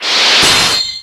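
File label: Battle Skill; Etrian Odyssey III HD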